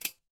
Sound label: impact metal hit